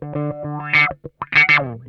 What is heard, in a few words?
OUCH, Funk Guitar; Zero G - Funk Guitar